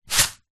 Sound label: epsom salt in card box movement shake; foley